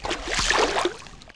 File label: Water Out, Footsteps